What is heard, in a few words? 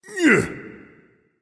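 nonverbal grunt lift; smite; voicepack; ullr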